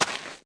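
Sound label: footstep gravel; UrbanChaos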